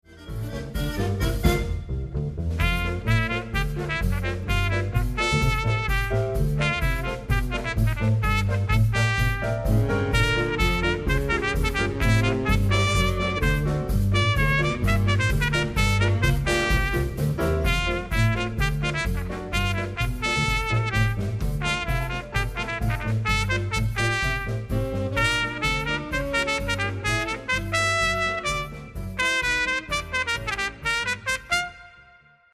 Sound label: straight mute